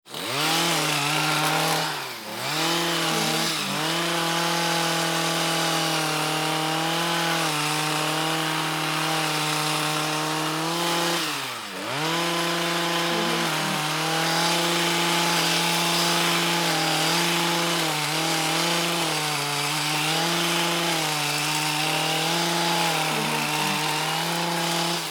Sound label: chainsaw cutting branches